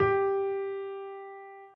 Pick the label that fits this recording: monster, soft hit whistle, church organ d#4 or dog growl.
soft hit whistle